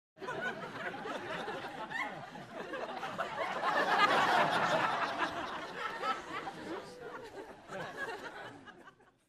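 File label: Crowd 100 Sparse Light, medium int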